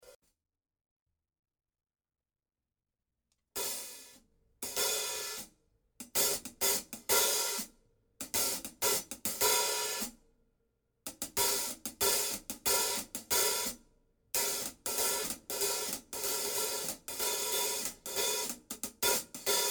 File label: 14 HH